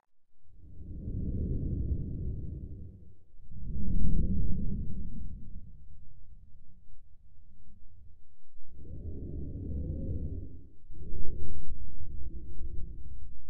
Monster breathes and hisses slowly. Alien, Breathe Growls, Monster Eerie Sound